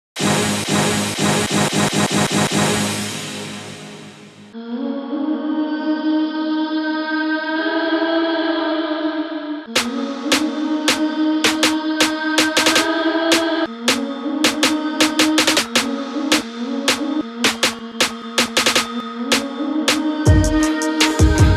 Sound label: modern hip hop song beetz